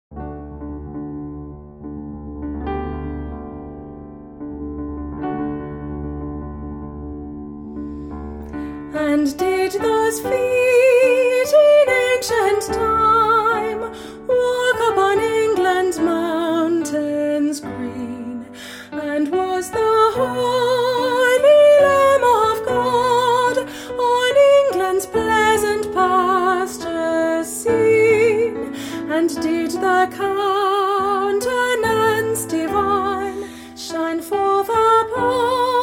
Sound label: Jerusalem Unison